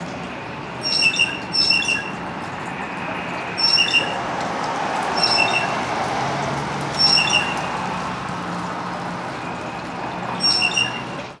Downtown Houston Bird; at Bell St and Fannin St

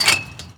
Park Baseball Backstop Perc; Moody; Houston

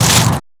Tree Branch Perc, percussion; Lindale; HOUSTON

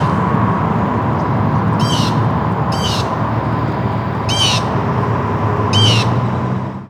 Bird; Lindale; Houston

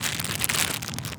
Flamin Hot Cheetos Bag, percussion; HOUSTON; Moody Park

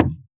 Moody Park Playground Perc; Houston; percussion

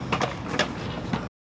Skate Park Skateboard, percussion; HOUSTON; Jamail

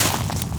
Park Footstep Perc; Moody; Houston

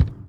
Ticket Counter Perc

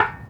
Bust, percussion; Hermann Park; Bust of Robert Burns; Houston

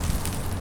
Emancipation Park Leaves, percussion; Third Ward; Houston

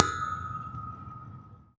Singing Poles, percussion; Houston; Moody Park

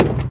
Bleachers Perc, percussion; HOUSTON; Moody Park

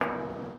Toyota Center Hakeem Bronze, percussion; Downtown Houston

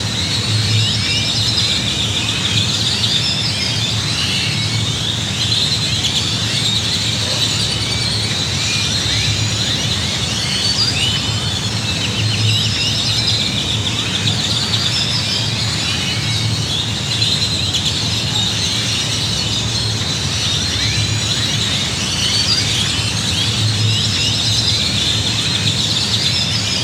Downtown Houston Grackles

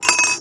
Moody Park Chimes Perc; Houston; percussion

